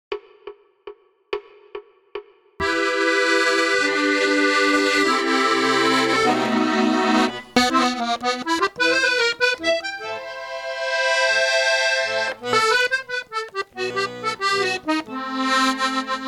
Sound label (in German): Accordeon play along